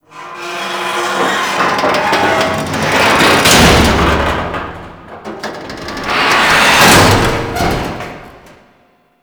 Fan Destruction; Ambience; Gameplay; Warframe; Corpus Infested

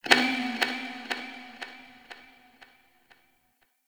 Ship Energy Bounce; Warframe; Corpus Ship Remaster

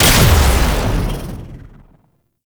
Lightning Gun Shot; Caliban Prime Boss; Warframes

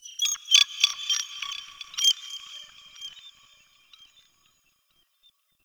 crystal bird; Warframe; Tau

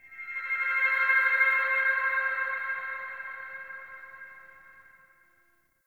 Rail Jack Texture, Ambience

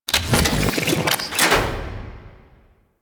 Ocean Toxin Cell Activate; Ambience; Warframe; Grineer Ocean; Ocean Sabotage Injection

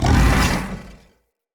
Corrupted Battle Dog Attack Bark; Animals; Vocals

